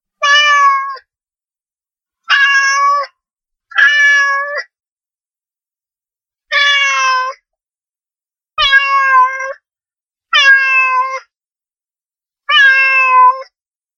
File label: animal sound